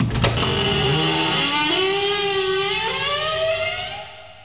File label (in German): Tür auf